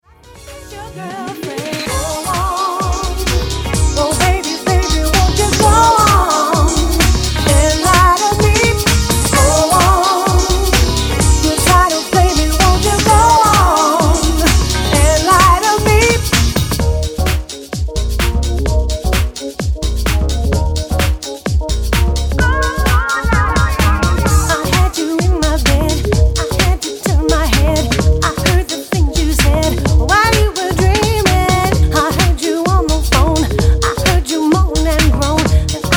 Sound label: house pop